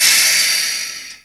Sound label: STEAM, INDUSTRIAL FX; Greytsounds